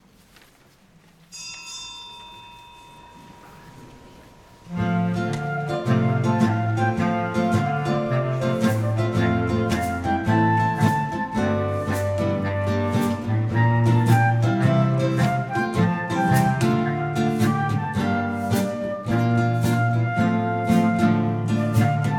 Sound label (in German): einzug (instrumental)